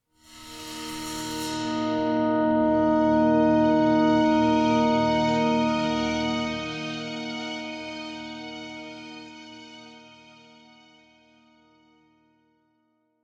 Stinger Cockpit

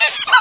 bug

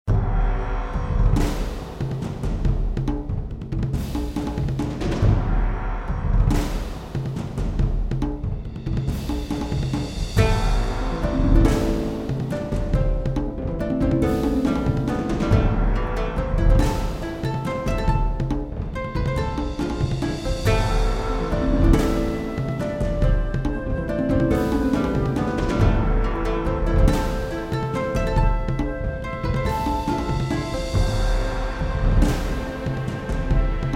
Medieval Theme; heretic operative

